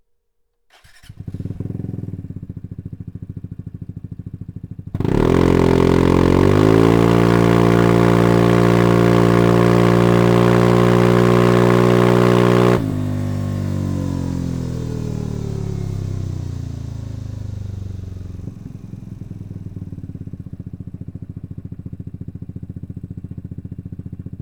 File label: Slip-On VESPA GTS 300 (open)